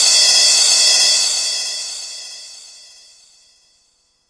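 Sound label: Yamaha RS7000 hihat cymbal, Drum machines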